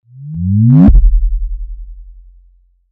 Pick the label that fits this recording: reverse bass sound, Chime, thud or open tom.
reverse bass sound